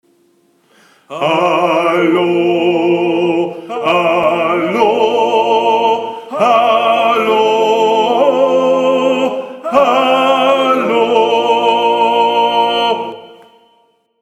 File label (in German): Hallo TENOR, Gesang